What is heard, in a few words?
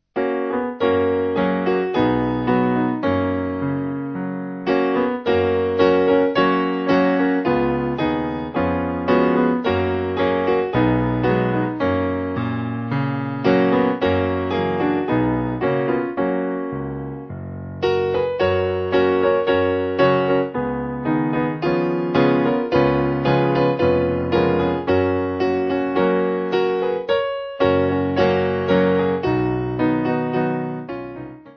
Piano; Simple Piano